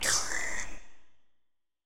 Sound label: Hurt, Monster; A Lonely Nightmare - Minimare (Monster) SFX